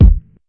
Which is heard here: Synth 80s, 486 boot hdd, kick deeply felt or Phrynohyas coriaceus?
kick deeply felt